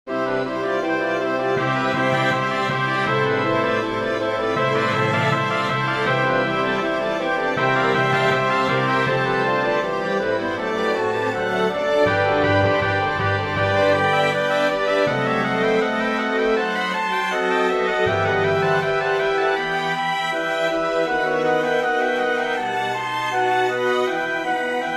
for small orchestra; bach johann sebastian; sanctus from the mass major

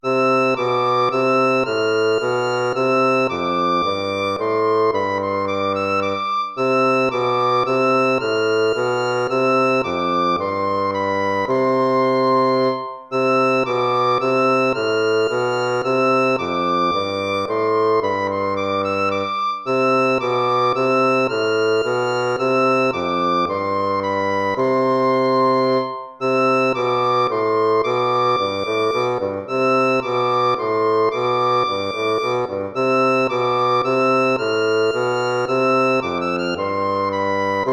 tempo minuetto; hook james

